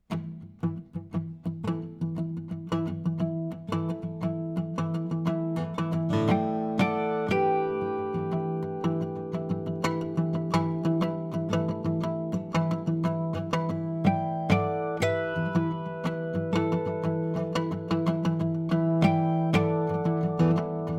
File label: Pedal, Guild12; Guild 12-string guitar; electric acoustic